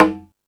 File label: Latin Layers, drum machine; E-MU Pro-Cussion-1